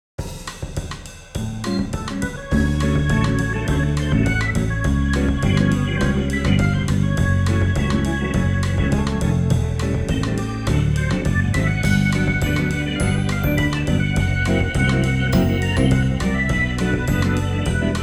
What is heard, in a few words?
Distraction Device Music; We Happy Few